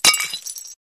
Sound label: Pieces of broken glass drop down onto ground